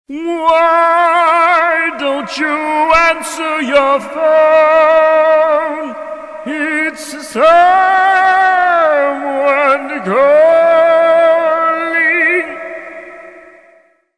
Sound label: opera phonecall